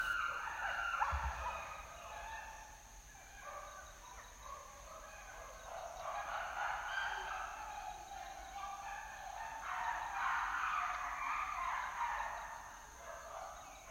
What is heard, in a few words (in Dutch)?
Apen geluiden in Kibale Forest NP Uganda